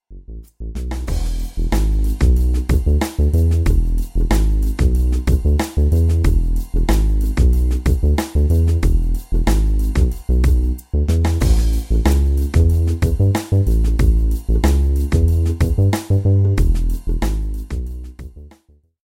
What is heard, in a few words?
bongo feel